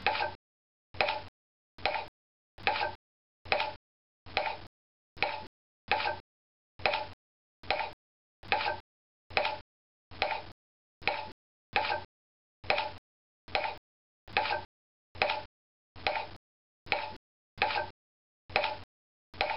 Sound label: rub tone from three tones of wood